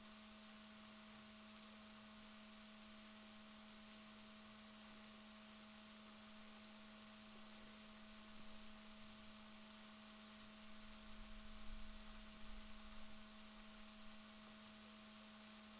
rorquals negatif, Balaenoptera Physalus Chile

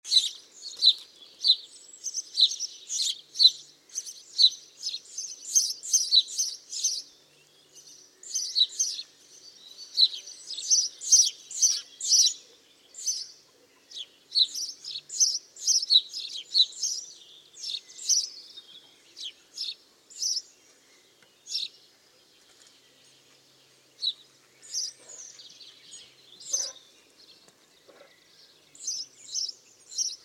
Passer domesticus; SETE

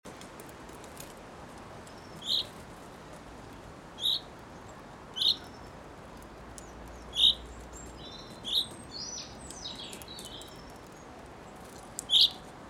Fringilla coelebs; SETE

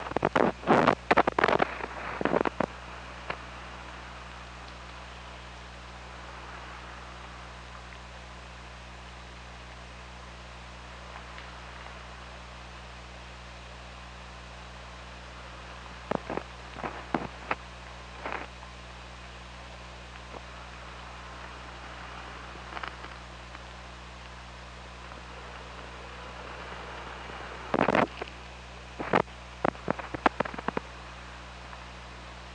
OrcaLab

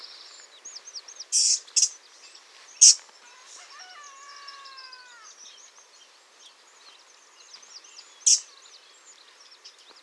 Troglodytes troglodytes; SETE

